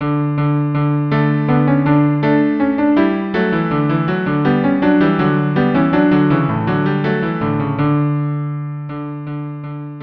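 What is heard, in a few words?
Menuet cello cello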